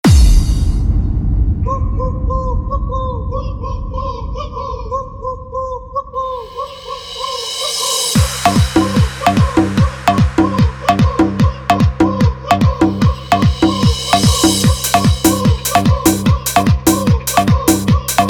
Dance Hard Bass Saund Testing Remix; GMS Bass; Dj Anurag BaBu Jaunpur King

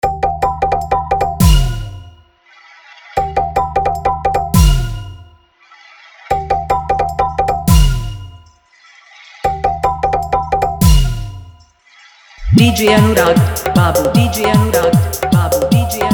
Dhollki Bass Dance Mix

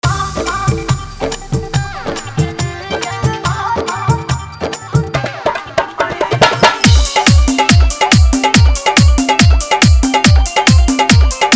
Hard Dhollki Mix

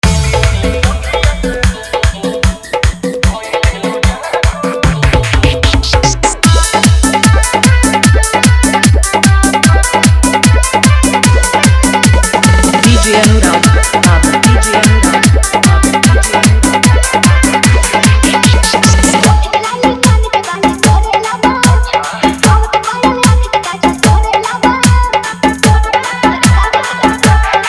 Dhollki Bass Dance Mix

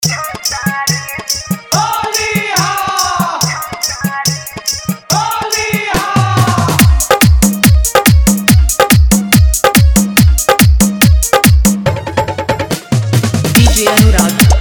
Bhojpuri Holi Dj Song

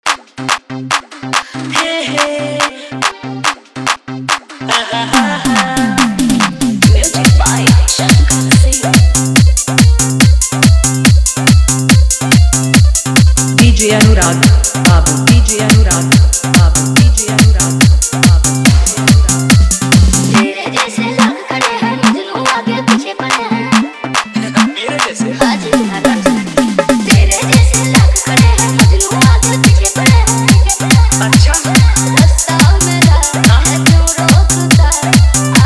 Bhojpuri Hard Bass Mix

